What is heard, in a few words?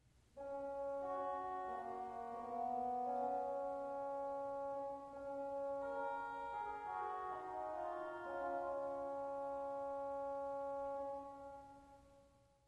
English Horn